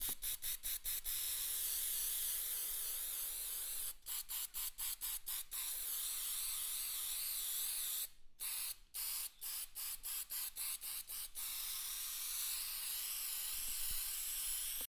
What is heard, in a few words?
Spray